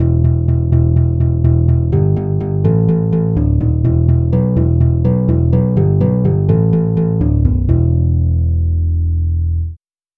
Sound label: nylon string guitar bass